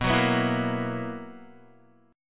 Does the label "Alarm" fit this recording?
No